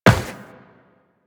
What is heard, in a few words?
Run Metal, Footsteps; Warframe; Tenno